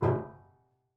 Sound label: Prepared Piano